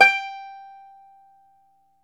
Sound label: FLAT TOP, Flat Top Banjo